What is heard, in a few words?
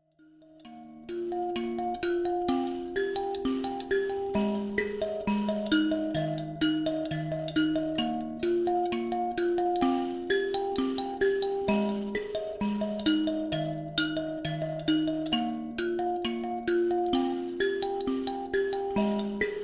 mbira